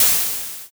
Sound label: steam